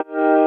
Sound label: Eb STAB, funky